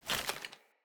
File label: Foley Action Armour Walk; Characters; Mother Armour